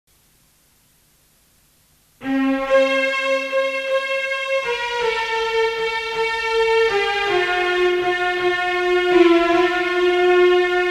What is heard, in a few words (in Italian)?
Canone per questo pan